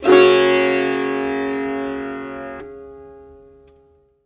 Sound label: SITAR CHORD; SITAR CHORDS